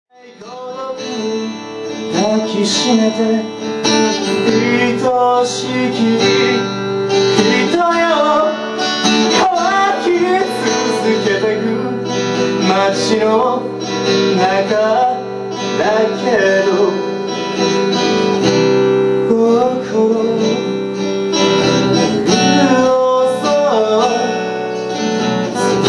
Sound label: waltz